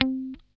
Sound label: GUI C4, E-Gui Muted; Sound & Vision; Gigapack I CD 2